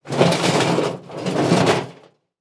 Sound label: Impact Garbage Can Drag Over Dirt And Rock